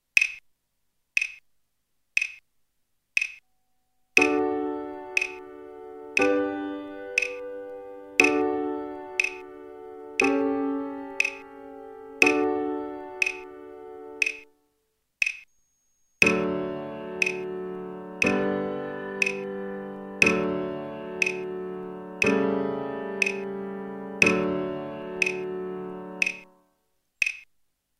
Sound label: code progress